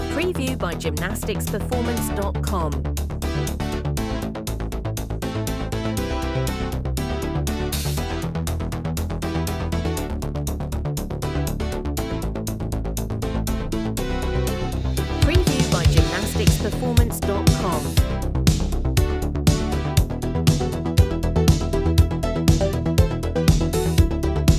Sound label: 80s pop dance